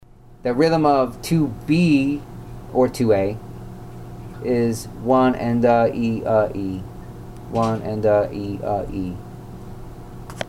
integrating directional picking and hammer ons pull offs, guitar